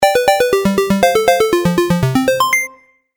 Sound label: Retro Game Melody; Video Game Sound Effects